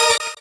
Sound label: normal hit normal